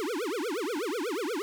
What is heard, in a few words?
fs gobb fly; netent